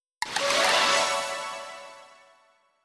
Nada Dering Nokia Jadul Message

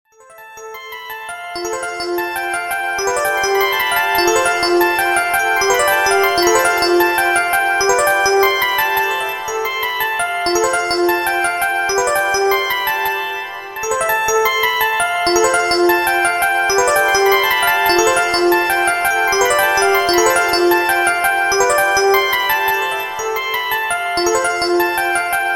Nada Dering 3D Retro Circles

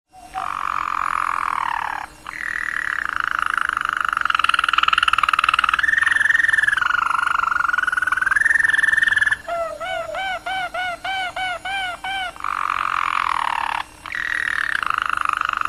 Suara Kenari Roller Jerman; burung Kenari terbilang menjadi jenis burung kicauan yang cukup populer

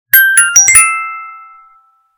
Nada Dering Lingkaran iPhone Notifikasi

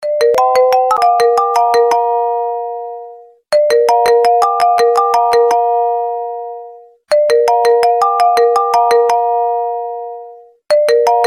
Nada Dering iPhone 15 Plus Original

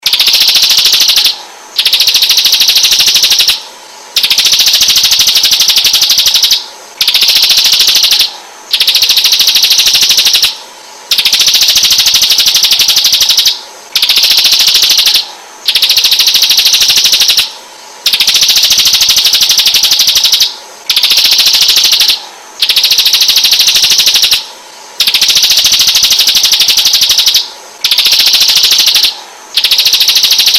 Suara Kicau Burung Ciblek Ngebren Gacor

